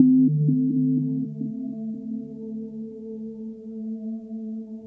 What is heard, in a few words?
mellow beeps into atmosphere; LOOPING AMBIENCE